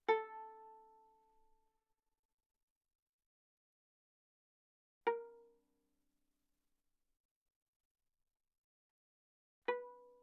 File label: Viola pizz sul A ff A4 B4